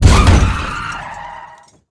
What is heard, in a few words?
hit car; Blind Drive